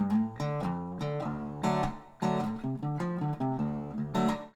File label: Guitar